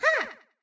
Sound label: jump; toadette; sm64coopdx; char select extra chars